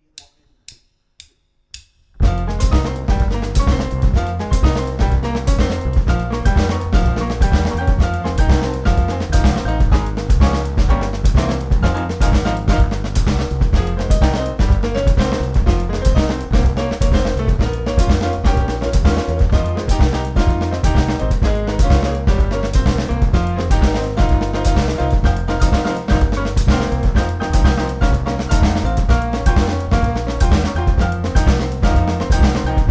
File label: Samba time (backing only 4 choruses)